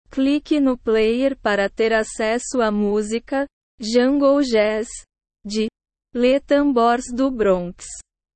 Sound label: jungle jazz